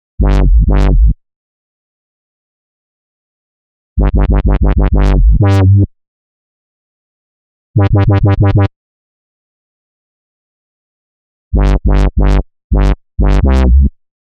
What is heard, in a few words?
127 Gm Bass, Complex Electro Synths; Prime Loops; Shoxx